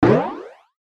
Ascend Cartoon Boing; Blastwave

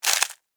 Insect Eat Chew, Bite, Crunch, Bug; Animal; Insects Eating; BlastWave

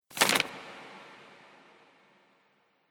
Monastry Manuscript Paper; Twistedtracks

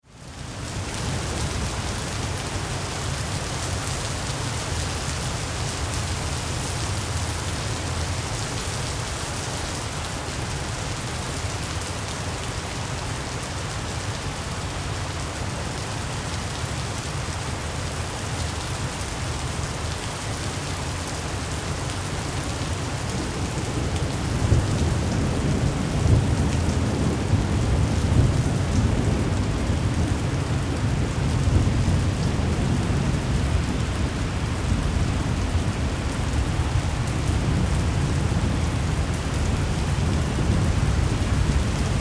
Weather Thunderstorm Rain Splatter Ambience

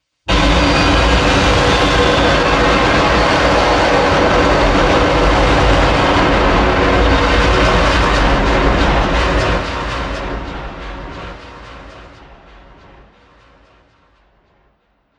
Explosive Destruction Scifi Attack; Bomb Sound Effects

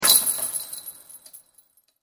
Sports Basketball Chain Net Swish Rattle; SFX Bible 6

